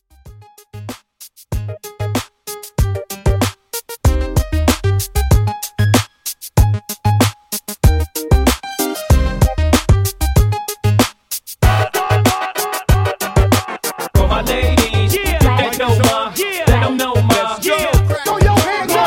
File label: Intro Old School 95Bpm